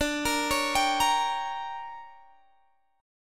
D Major 7th Suspended 4th Sharp 5th Guitar Drop D, chord